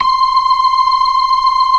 96 BLOOD L 3, ORGAN; Keith Emerson Signature Series

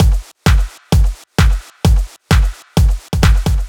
130 Bd Snr, 130bpm; Nu Dance Electro; Zero-G; Soundclash; Combi Loops; The Oldskool

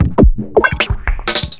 FILTER, Big Beat Drumloops

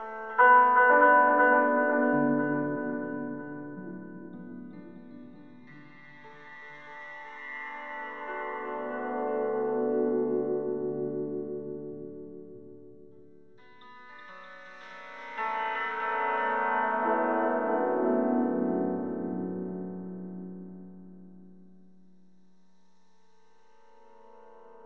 Piano Wave; Sonic Foundry (Sony Creative Software); Slow Silhouette

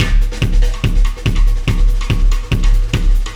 Beat, Loops; Sonic Foundry; Not Just Another Pretty Bass; AI Loops - Siggi Baldursson

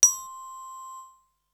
Bell; ZERO G - Datafile DISC 3

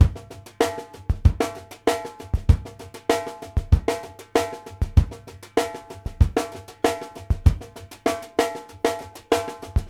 STRAIGHT R, New York City Drumworks, BR+STK 96; Sampleheads; New York City Drumworks VOL-1

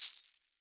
MIXED PERC; 90 s Sample CDs

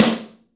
SN, LA SNARE

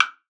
WOOD B, PERCUSSION; Best Service ProSamples vol.42

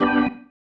Stab One Shots, Organ

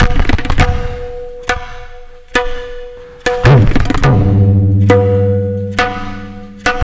Tabla, 140 BPM; Zero-G; Pure Tabla

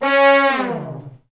BRS LNG FL, Section FX Fat Falls; Roland L-CDX-03

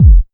127 DRM, Fresh Disco House; USB Soundscan vol.20 - Fresh Disco House I

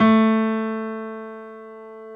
PF STB A 2, S GRAND PF

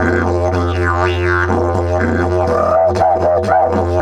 120, Didgeridoo

